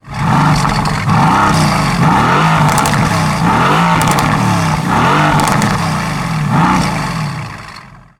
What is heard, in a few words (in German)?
Sportwagen Sounds Mercedes AMG GT; gts